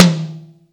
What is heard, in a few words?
Toms